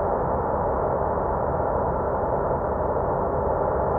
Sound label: sparse soundscape samples; ReLooped